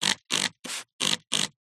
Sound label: household water spray bottle spray lever noise